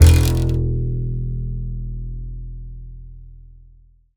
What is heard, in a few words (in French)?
contrebasse